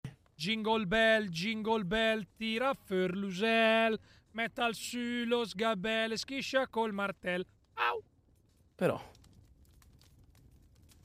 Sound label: jingle